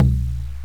Bombo Leguero

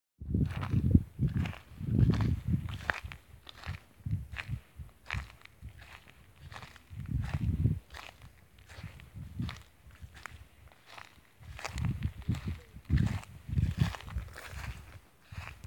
Pisadas En El Campo

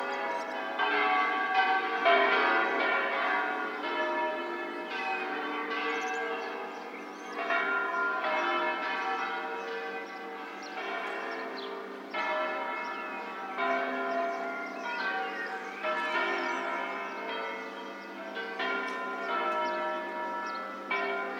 Campanas

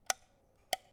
Interruptores Luz

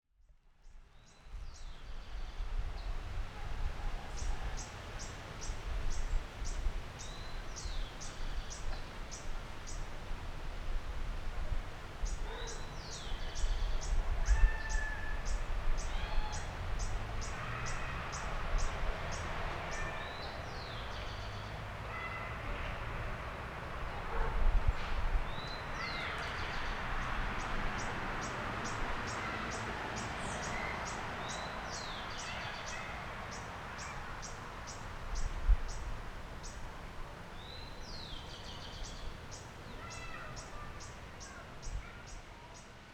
Parque

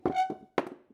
Silla Acomodada

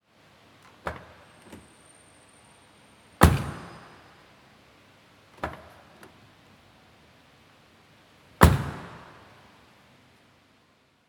Puerta Mercedes A Class

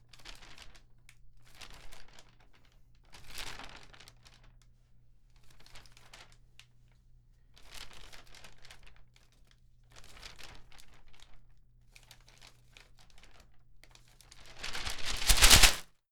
Periodico

